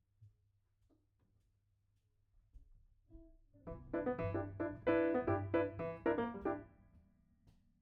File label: Piano B